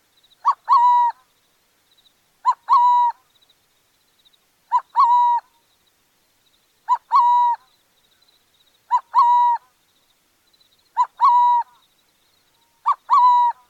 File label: Burrowing Owl